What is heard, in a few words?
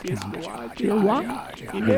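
Music Village Calm Inside; from dust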